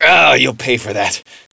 pain, player; SINDEMO; blade

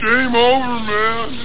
game over

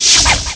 fire swrd; DARKOMEN

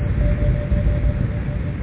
background; Viper

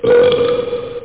ping

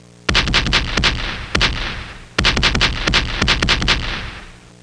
cannon, military; Easy Personal Web Page

